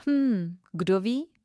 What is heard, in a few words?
hmm